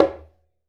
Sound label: Conga High Muted Slap; Essential Percussion